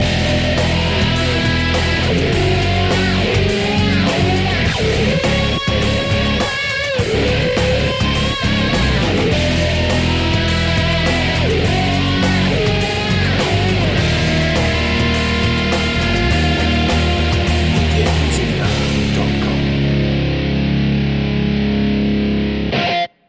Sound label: hard fight loop